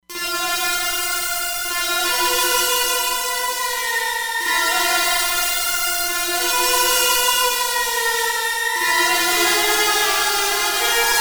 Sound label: Lead, Roland Fantom XR; SBF Lead